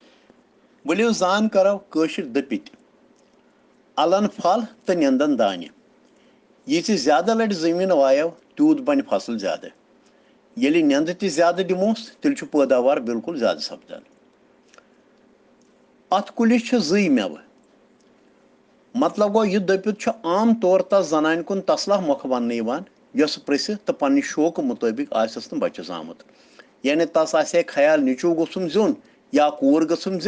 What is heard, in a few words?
Children Rhyms in Kashmiri